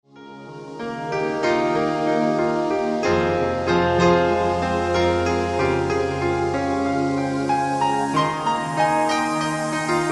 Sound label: etherical piano